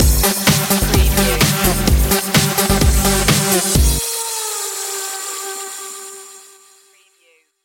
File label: club breakz stinger